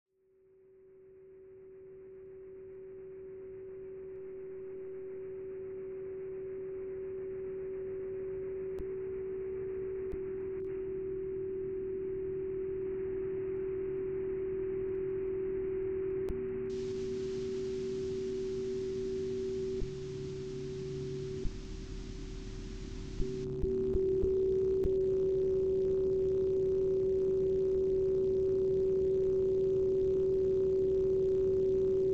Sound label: radionoise; Maple park